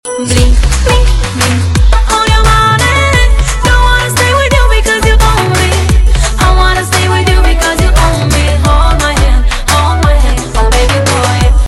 Bling Ringtone

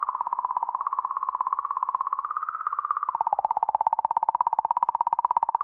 Misterious Song

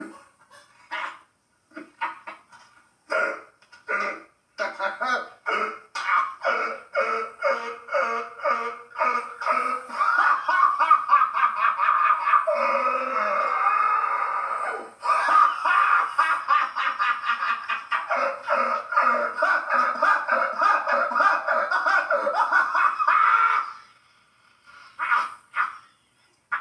Bathtub Laugh; Money Pit

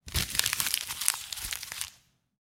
Paper Crumble

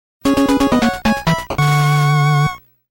Game Over; MM5

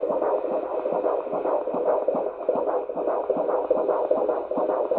Heart Beat

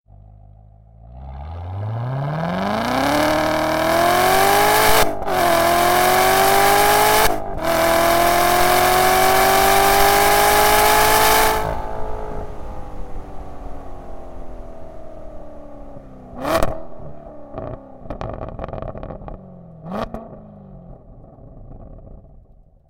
Corvette

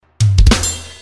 Ooom Puh Puh Ta Ping

